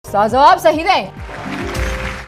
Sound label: correct